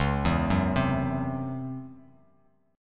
Db Minor Major 7th Double Flat 5th Db down Bass Standard; DbmM7bb5 arpeggiated